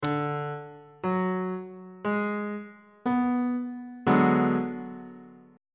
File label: c flat minor 6th 1st inversion on bass clef